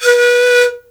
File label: PAN B 3, REED PIPE, Africa; Spectrasonics; Heart of Africa 1